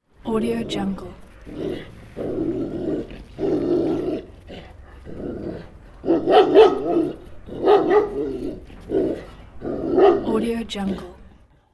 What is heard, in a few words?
angry dog barking